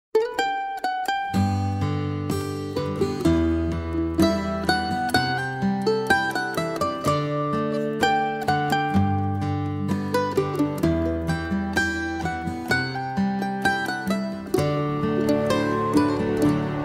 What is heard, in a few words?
mandoline solo